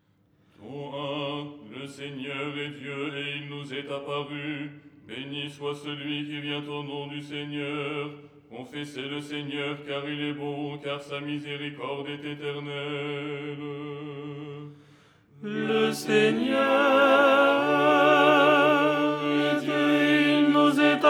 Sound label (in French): tenor